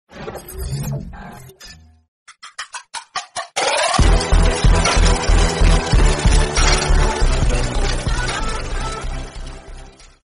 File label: intro music